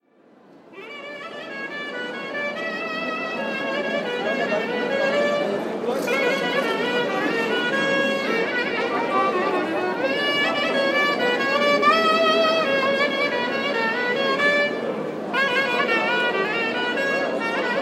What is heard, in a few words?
yet another clarinet istiklal